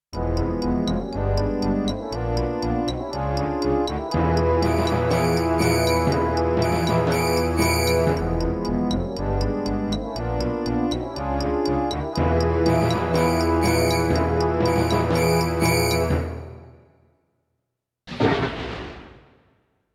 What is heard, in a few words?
CM500 GS